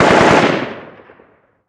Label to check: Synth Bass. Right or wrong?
Wrong